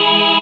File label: DR. LOOP; X-Static Goldmine 3; CHORDS